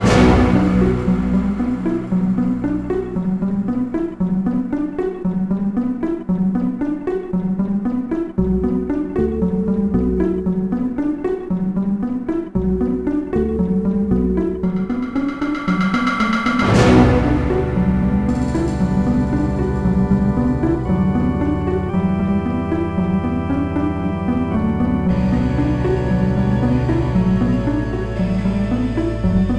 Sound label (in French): Salle Orgue; the new adventures of the time machine